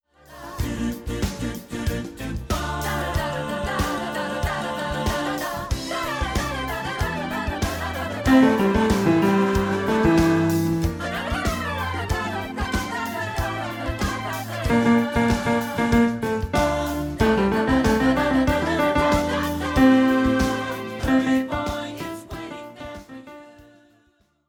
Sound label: Bass; Africa Bridge